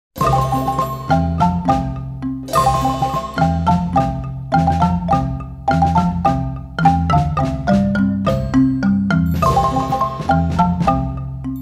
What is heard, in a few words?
Hallelujah Chorus, marimba; primer